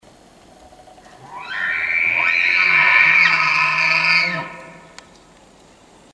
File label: bugle screams, elk